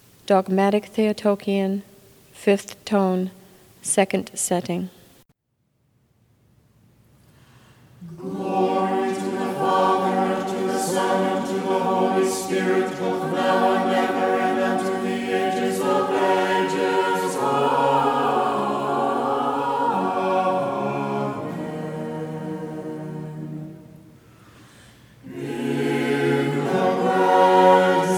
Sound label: Dogmatic Theotokion Tone 2; Setting 2